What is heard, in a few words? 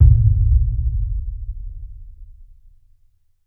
Impact Boom Rumble; bottlerocket